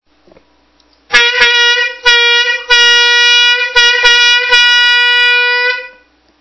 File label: horn